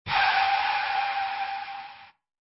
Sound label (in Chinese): 刹车, car; 车辆驾驶